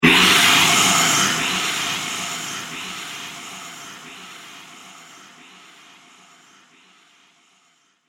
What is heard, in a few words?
Alien Dragon Shriek; Alien Sound Effects